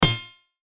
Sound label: CHIME BUMP; Multimedia; Chimes; Blastwave